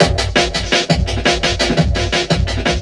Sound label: Beat, Drum N Bass